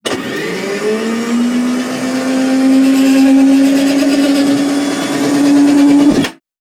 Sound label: Aircrafts A-10 Cockpit Canopy Close; DCS